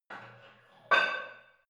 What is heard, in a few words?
barbell light plate put on hit reverby soft; sport freizeit; fitnessstudio